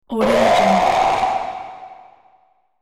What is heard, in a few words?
demon scream voice